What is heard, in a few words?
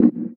One Shot, Hits